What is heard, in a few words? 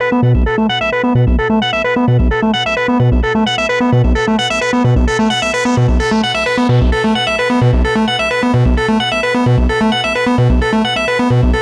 NRG Sweep, Trance